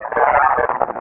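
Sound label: droid sentry dead; Star Wars Knights Of The Old Republic I; XBOX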